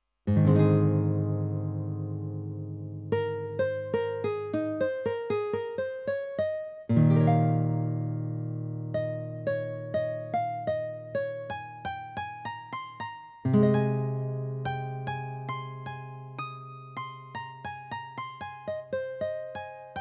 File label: Guitar